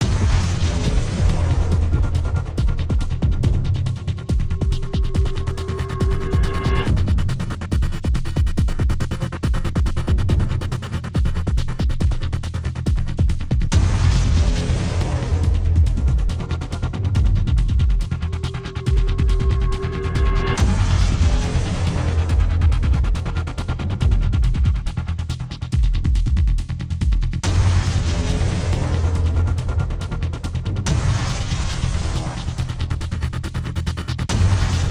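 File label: Music Battle Loop; Vortex Ridge; Transformers Fall of Cybertron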